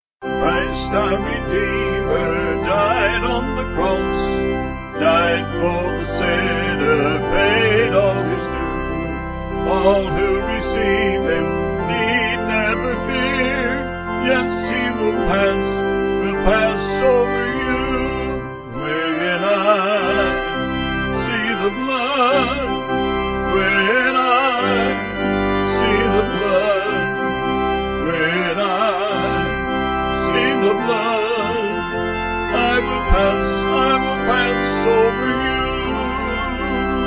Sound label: Vocal Organ